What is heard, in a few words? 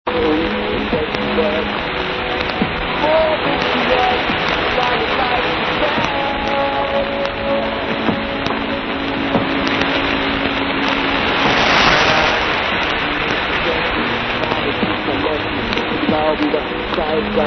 radio 6310.0 kHz; drp radio